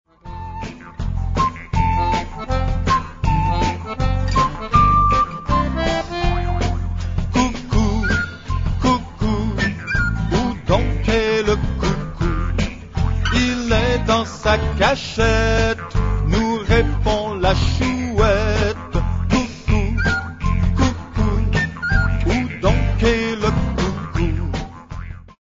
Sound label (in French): coucou